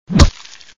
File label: creature attack; the necronomicon